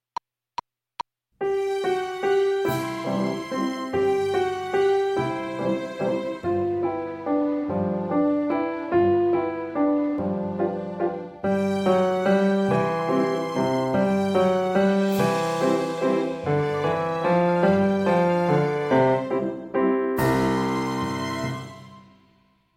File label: Piano